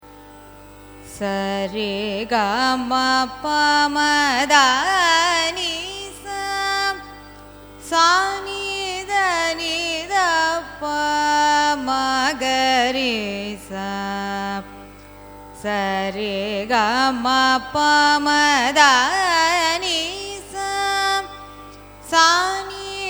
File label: raga sowrashtram arohanam avarohanam, carnatic music